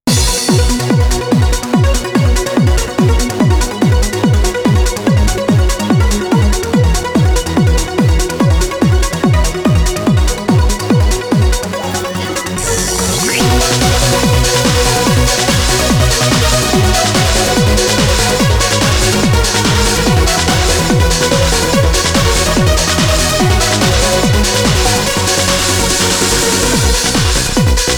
(Extend Trance Mix); DanceDanceRevolution X; arcade; PS2

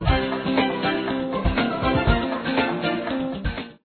Level Up; sonic adventure kiss online

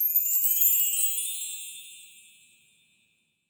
Kirakira; Yu-Gi-Oh! Master Duel

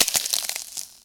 ice crack; Scrat

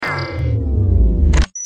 Sequence fail; Doctor Who; Adventure Games; Episode 4

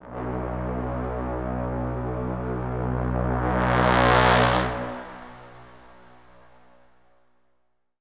BRASS EVIL DEEDS; transformers war for cybertron gamerip

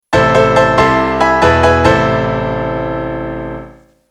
Sad Occasion

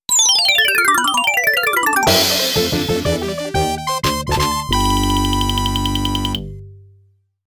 GAME START; Taito Digital Sound Archives Arcade Vol.4; Perikan ni noritai!

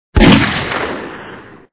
357mag; Dad 'n Me

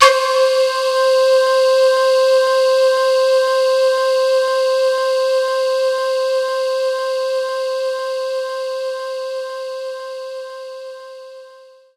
Shakuhachi; Knights Try

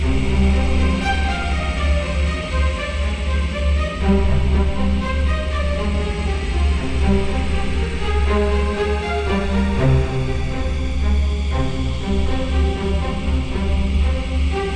musician skill blue loop; override mech city brawl ps4 switch windows xbox one gamerip 2018

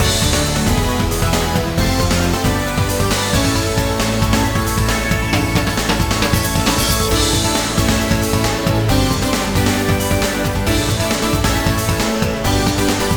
Goal Replay; Best Goal

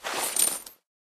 Tower Sell

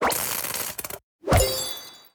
upgrade; Animation Throwdown; cardbabies

